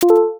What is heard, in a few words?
comedy mini ta da